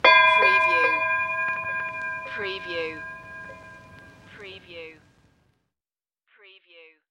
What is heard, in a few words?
Classic Vintage Ship Bell